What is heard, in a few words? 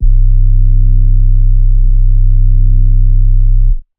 Synth Bass C, Trap; One Shot; HipHop; Melody Kits; 130 Bm; Ghosthack; Ghosthack - AC2024 - Day 5 - Melody Kits; Flowers